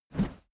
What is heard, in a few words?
whoosh heavy low short; swooshes-swishes